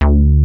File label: SUB BASS; ZERO-G; Datafile